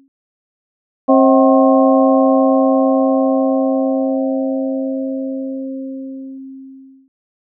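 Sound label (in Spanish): inarmonico Con Altura, Sonidos de espectro no armónico. No son periódicos.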